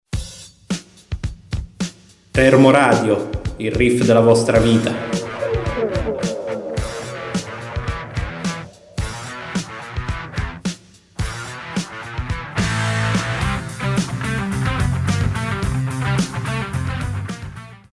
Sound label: riff, jingle; termoradio